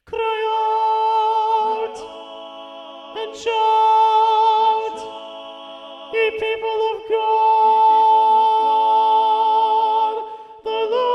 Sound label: alto 2 predominant; knut nystedt